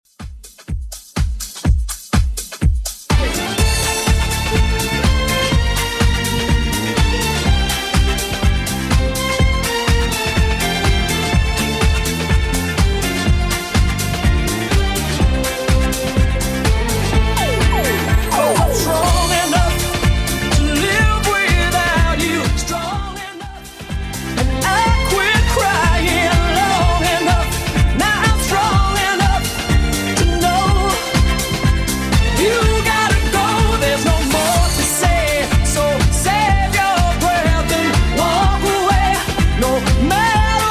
90s Redrum 124bpm

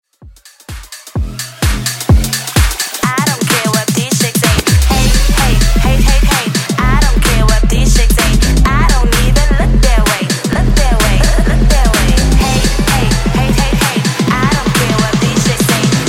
2000s Bass House Edit; 2000s Bass House Edit Clean

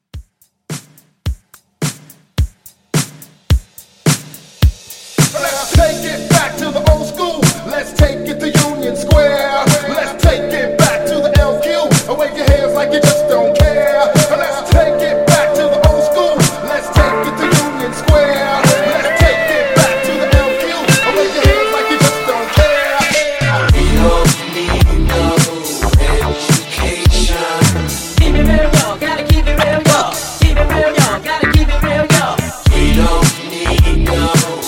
70s Classic Rock Hype

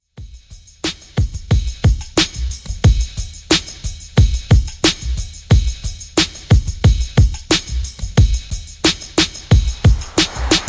Old School Redrum 90Bpm

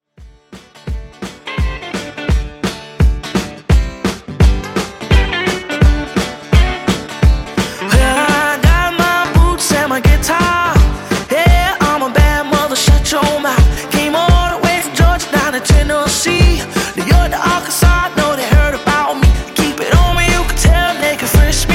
Hoedown Intro 85 Bpm

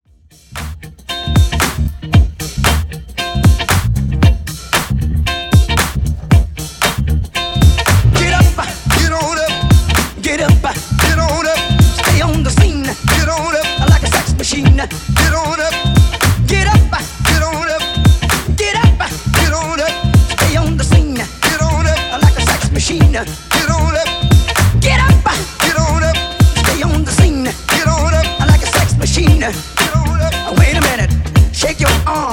Redrum 115 Bpm

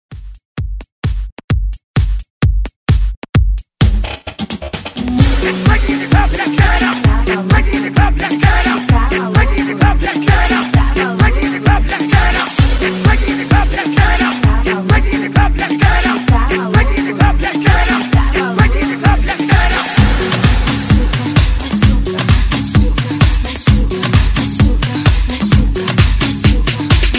ReDrum 130 Bpm

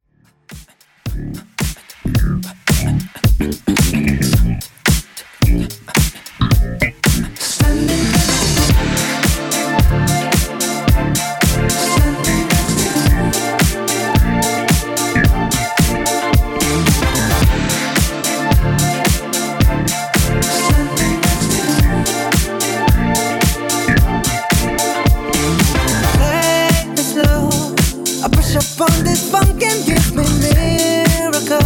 Redrum 110Bpm; djyan

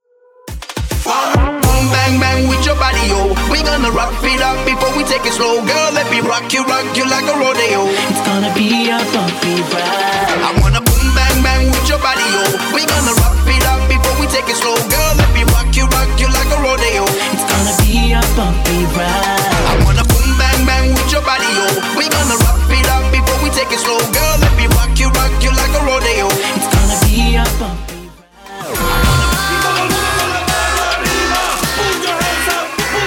Moombah Rwork 104bpm

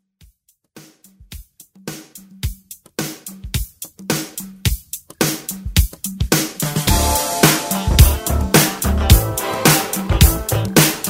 80s Disco Funk

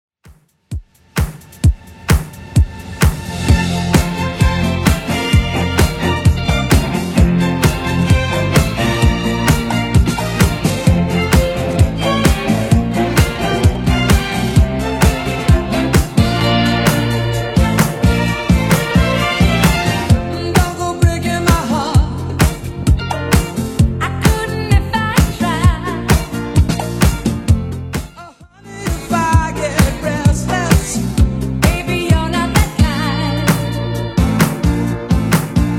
70s Redrum 130 bpm

